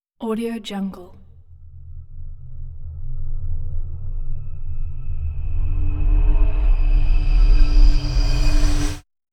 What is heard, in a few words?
magical build up riser; hollywoodedge